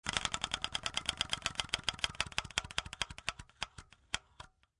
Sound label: Paper Hitting Wheel Spokes Wheel Of Fortune, Wheel of fortune: Spinning wheel, paper hitting wheel spokes; Gambling; Lynne Music